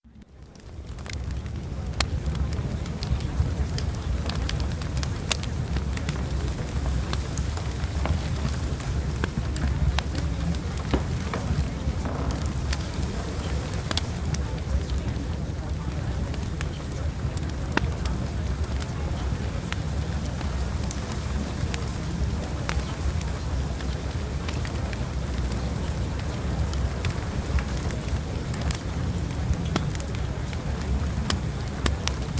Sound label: Fireplace